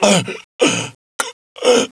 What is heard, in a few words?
choke; SINDEMO; player; blade